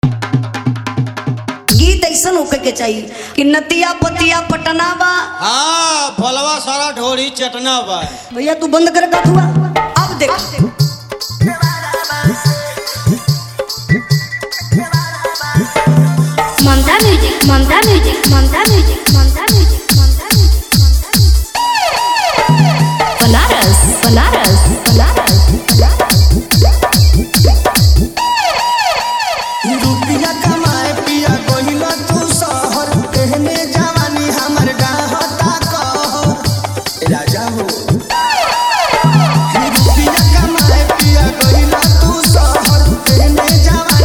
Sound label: New Bhojpuri Dj Remix Song